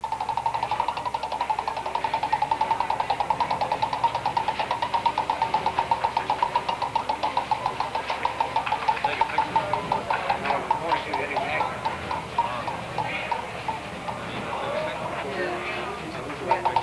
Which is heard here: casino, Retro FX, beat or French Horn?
casino